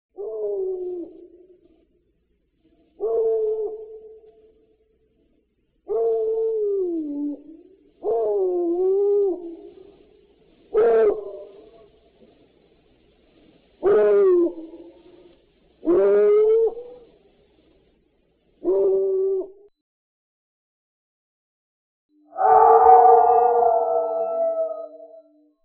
dog and howl; Halloween

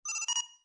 Beep; Star Trek

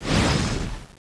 Probe Launch; Star Trek

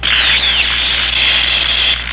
Electric; Twin Peaks

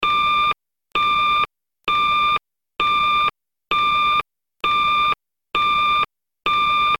Batphone

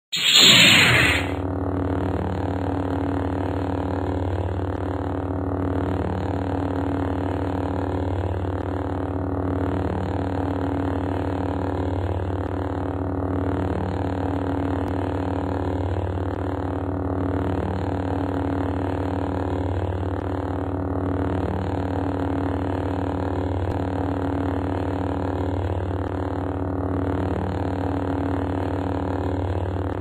Lightsaber; Star Wars

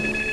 Beep; Star Trek

